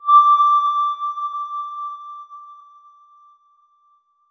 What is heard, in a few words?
soft reward click sound; feedback